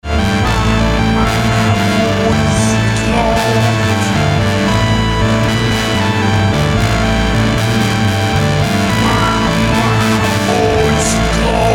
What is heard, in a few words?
Theremin; atelier Theremin